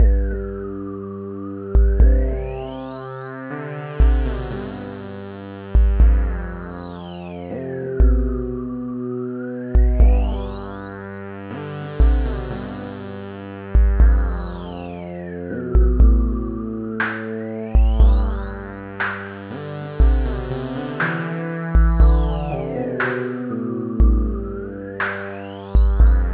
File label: slow synth